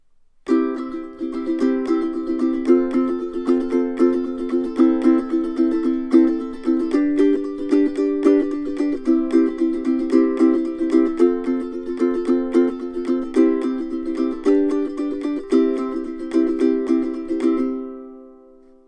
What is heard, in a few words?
chorus strum, ukulele